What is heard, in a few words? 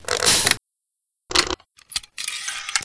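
crossbow reload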